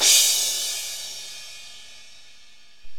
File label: CYMBALS; OMI - Universe of Sounds